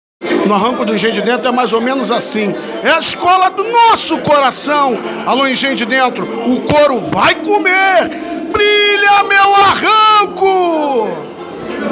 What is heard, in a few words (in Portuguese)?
grito